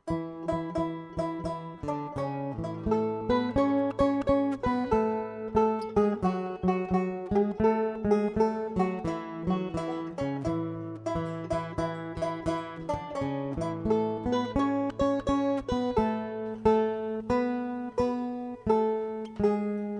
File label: Battle Hymn